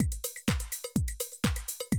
125, Classic Drum Loops

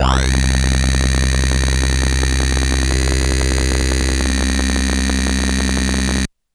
OB-6, Synths; YOYE

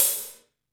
HH, Real Kit

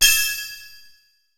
synth bell; AKAI S6000 CD-ROM - Volume 5

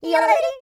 YODEL; ILIO; Vocal Planet

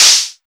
open hihats, techno; Techno ID; single-drums

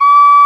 P WHISTLE, Old World Instruments, Winds

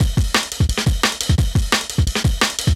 DNB BREAK

